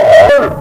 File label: ministrider hunter found enemy